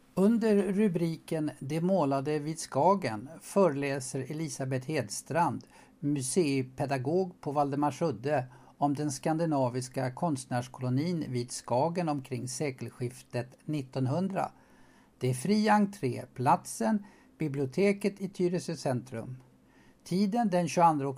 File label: Jingel; Skagen